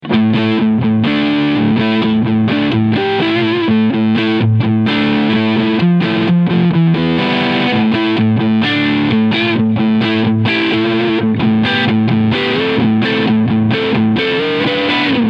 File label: Fusion T 25th Firemist Crunch Sound beide PUs SC Mode; Harley Benton